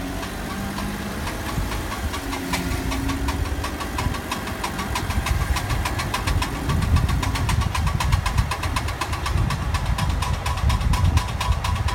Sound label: bad cv joint